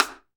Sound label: SD RI, SD Rim; Sound & Vision - Gigapack I